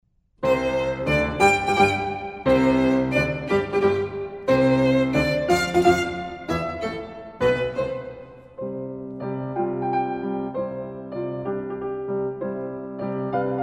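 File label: violin sonata; godard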